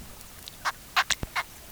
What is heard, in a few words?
Pimelodella sp, Amazon, soniferous